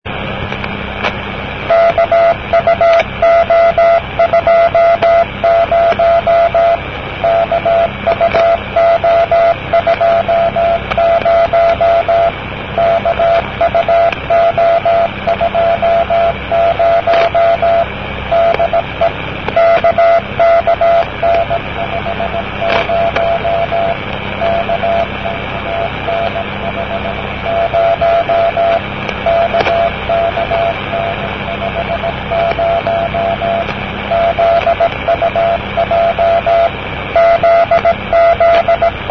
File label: cw